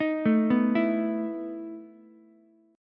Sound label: E Major 7th Suspended 4th, Ukulele; arpeggiated; JGuitar